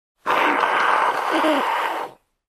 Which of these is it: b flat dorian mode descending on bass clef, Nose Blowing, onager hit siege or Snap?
Nose Blowing